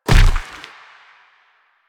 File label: Proto Infested Runner Step Default; Warframe